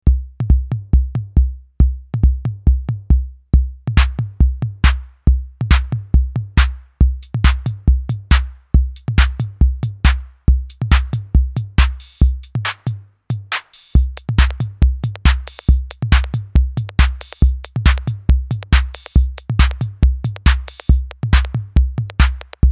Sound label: TT 606 Mini Beat